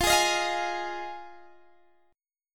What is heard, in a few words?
F Minor 7th F Guitar DADGAD